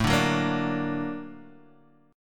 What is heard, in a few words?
Bb Augmented Major 7th A